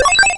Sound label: ball collects key